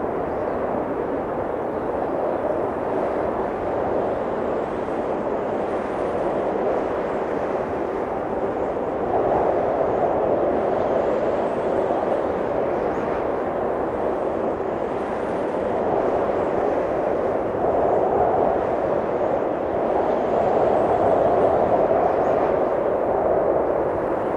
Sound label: WIND MID; SIMUZENSOUND; BOUNCE